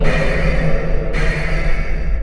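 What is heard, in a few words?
chime; lander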